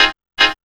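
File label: PIANO CHORD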